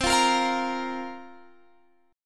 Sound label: A sharp Suspended 2nd C